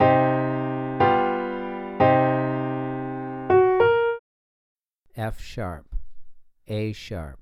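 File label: 2 Note Melodic Minor f#3 a#3, piano; ear training; melodic ear training for musicians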